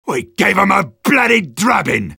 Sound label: sniper cheers